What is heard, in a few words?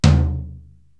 Tom Jazz Floor, Korg TR-Rack drum machines